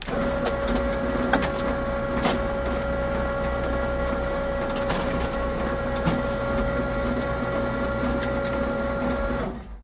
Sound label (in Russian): zvuki kseroksa